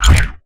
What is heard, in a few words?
model pickup; build mode